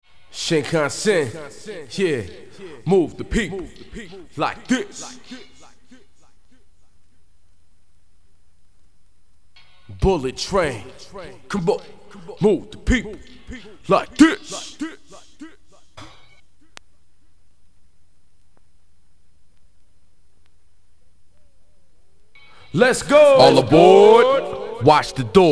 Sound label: acapella